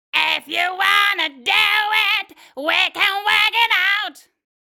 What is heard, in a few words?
growl; CVT